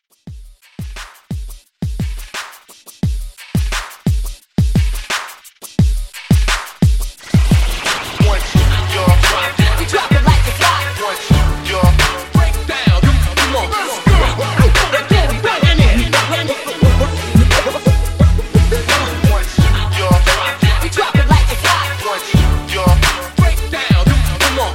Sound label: Party Break In Out 87